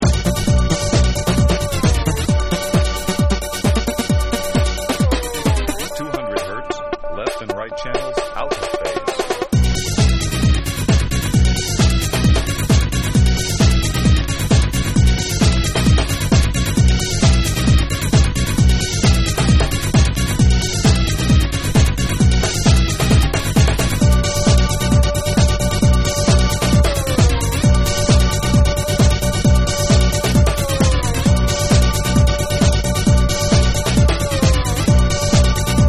SWEEP ON BOTH CHANNELS

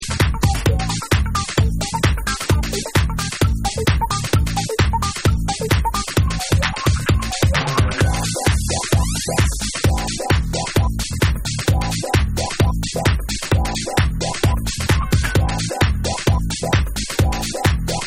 Saxy Dub

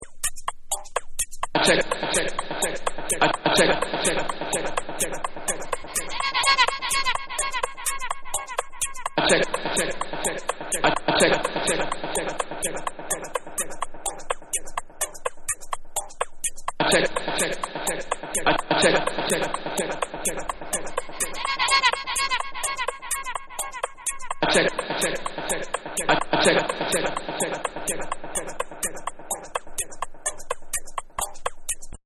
Loop

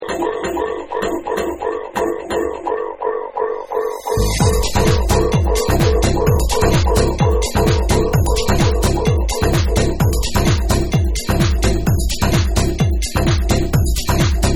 MECHANICAL GROOVE

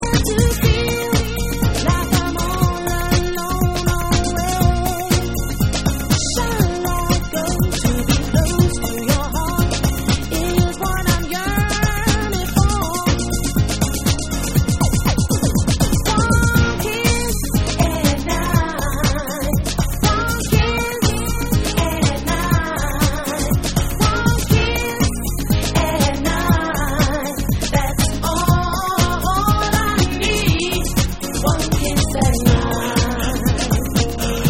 Piano Mix

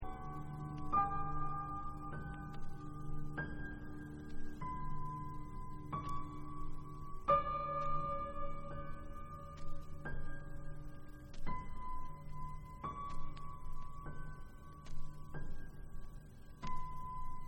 piano

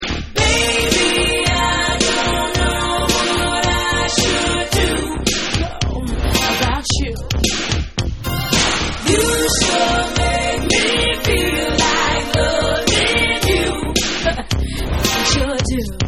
Vocal Short Version

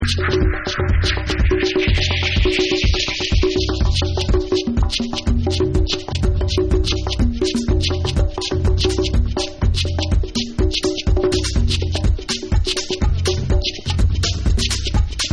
Tribal Beats

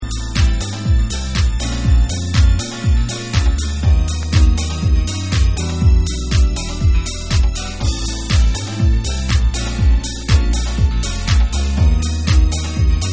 Instrumental Mix

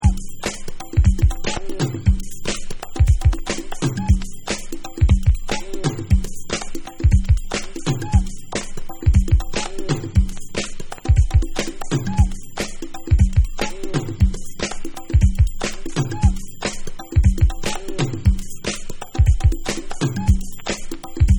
GROOVE BEATS

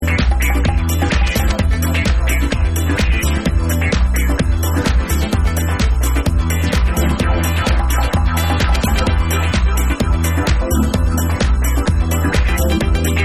FOREST FUNK

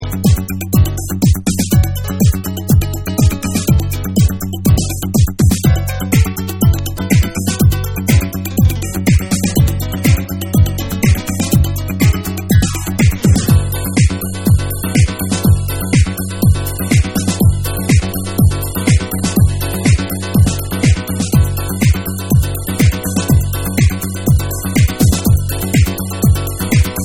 DANCE Jazz Mix

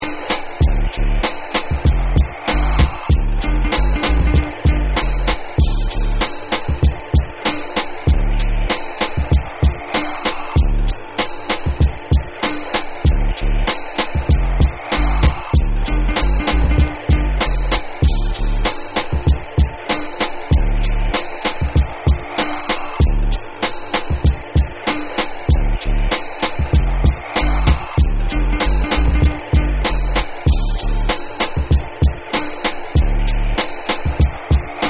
CLAP Instrumental

